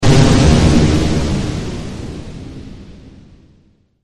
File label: Explosion; Rei Yumesaki